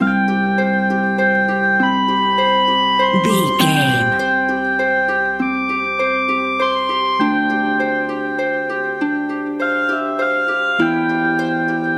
beautiful village full loop

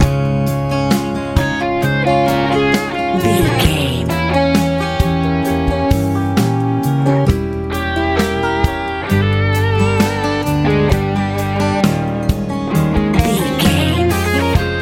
colorful big good airplane ride life easy pop

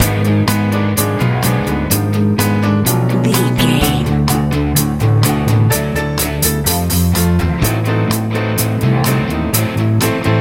powerful warm big wind sprint dreams 90s rock

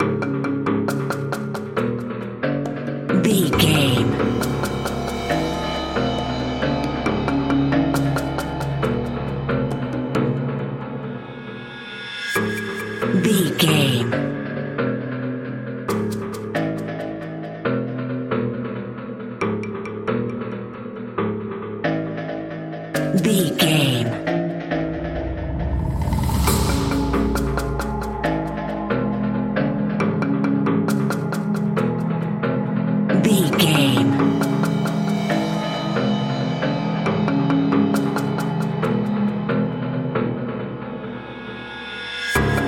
wilderness tension

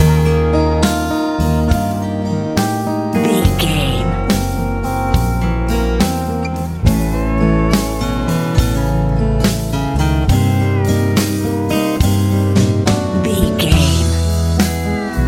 country ballad

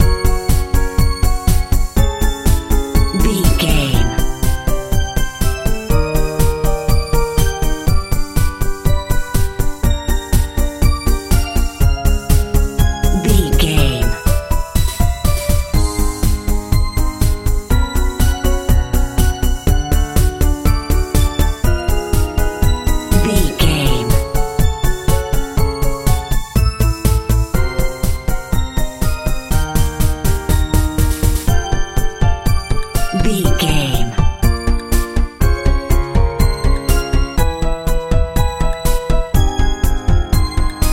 bright hot swirl bubblegum pop

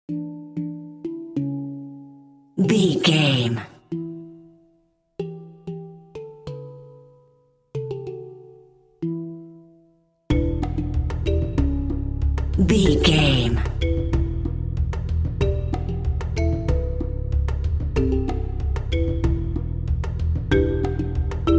determined mallets and piano

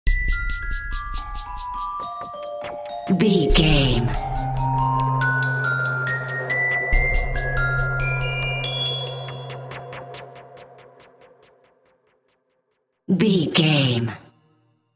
mysterious shadowy figure

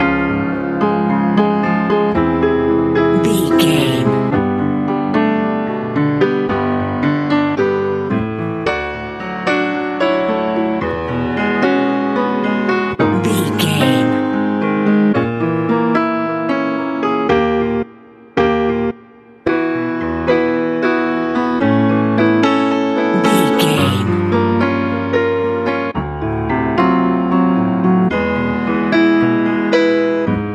lite pop

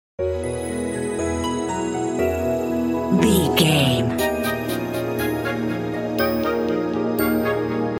land fairy tales loop

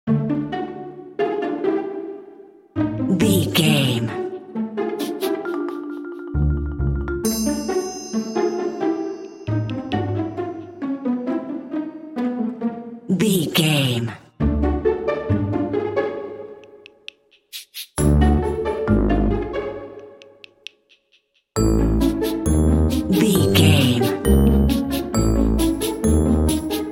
stealthy tiptoeing

